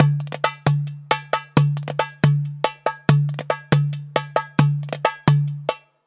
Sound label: DERBUKA; USB Soundscan vol.63; AKAI